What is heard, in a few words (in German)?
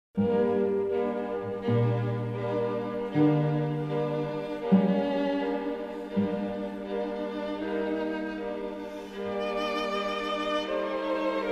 Streichquintett, Adagio. Allegro; Mozart; Streichquintett No. 3l